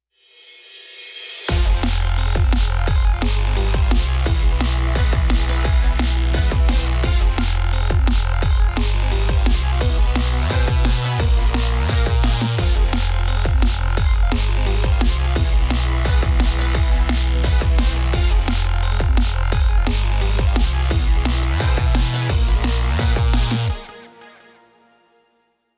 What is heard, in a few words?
Heavy Dnb; Cyber Hook